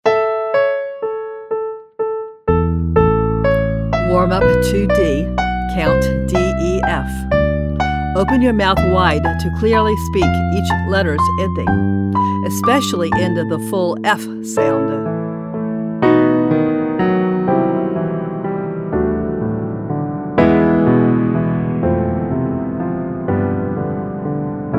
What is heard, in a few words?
Vocal Warm Up Count DEF